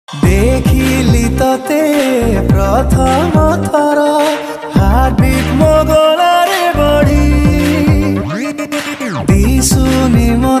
Odia Romantic

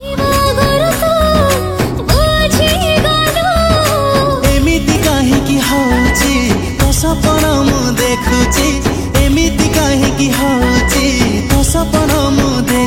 Odia Music

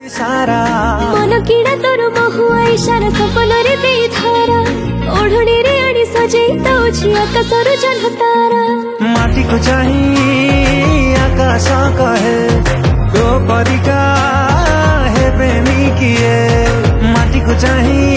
Odia New Romantic Song Studio Version

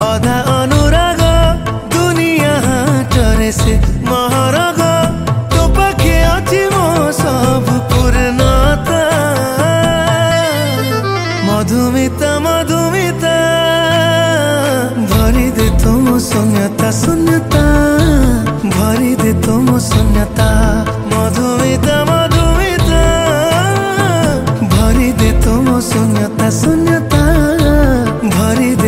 Odia Music

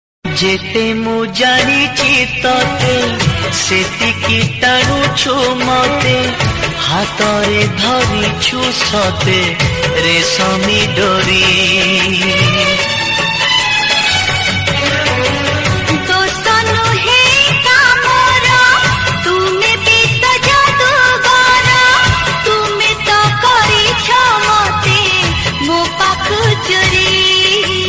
Odia movie song

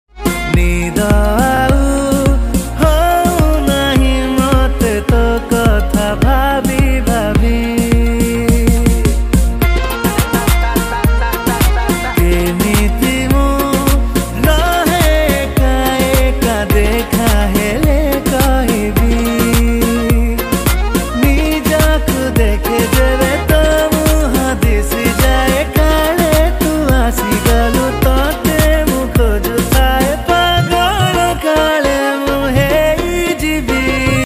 ROMANTIC

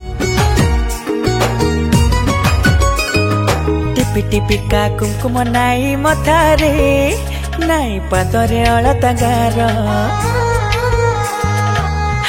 odia romantic song

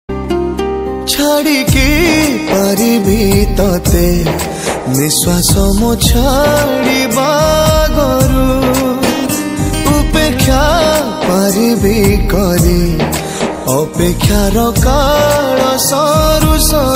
new odia romantic

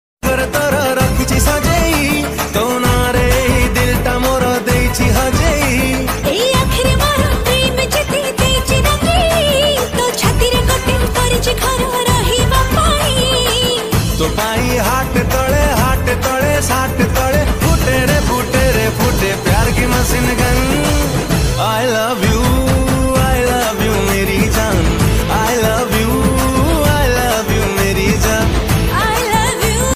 odia song

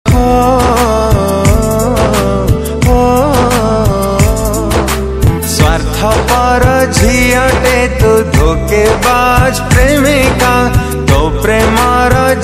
Odia Sad

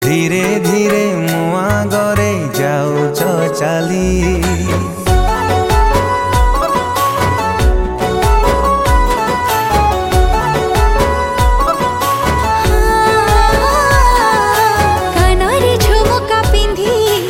New Romantic, Odia

